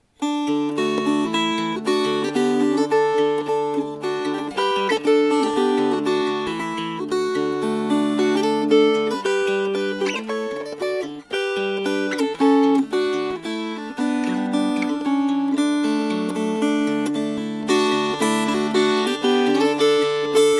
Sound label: Fingerpicked